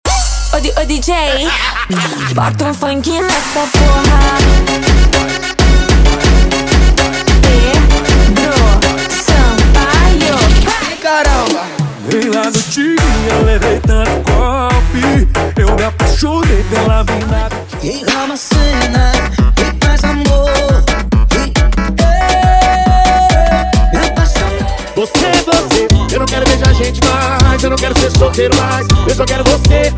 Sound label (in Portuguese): Funk Light